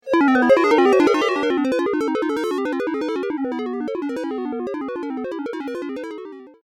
Alien Machine Computing Data; Arpeggio Ascending & Descending; Computers; BBFX

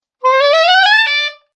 A saxophone funny run.; Funny Musical Sound Effects; Sound Effects:Musical

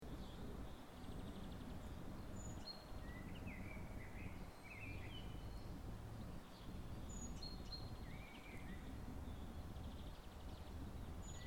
Springtime Light Residential Ambience, Norway; April. Quiet daytime with light birdsong, between woodlands

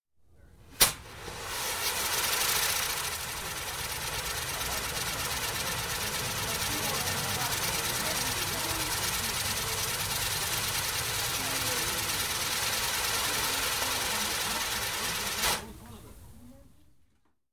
Fireworks, Catherine Wheel with indistinct speech; BBC

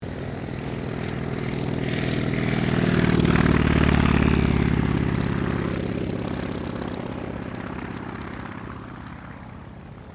AIRCRAFT CAC WIRRAWAY TAKEOFF; CA-16 Wirraway; Airplane; Vehicles; Airplane Sound Effects

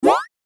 8 Bit Style Jump; video game

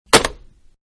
Telephone-Slam Down; Telephones (Sound Effects:Electronics); Manhattan Production Music

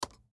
Laptop Type Single Key; Laptop Computer; Computers (Sound Effects:Electronics)

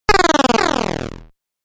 Falling Video Game

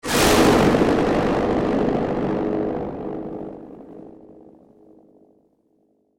Distortion Crunch, Flutter

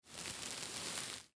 Wig Hair On, Wig Long Hair Put On Head; Dressing; Human; Foley; Blastwave

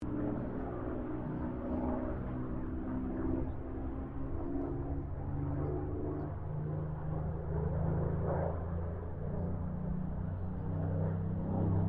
AIRCRAFT SUPERMARINE SPITFIRE MK-XVI PASS

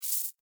Insect Hiss Angry, Insect, Hiss, Angry, Bug; Insect Hissing; Blastwave; Animals:Insects

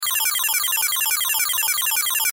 Video Game Beeps; Vintage Beep Chime; Technology; Video Games; Blastwave

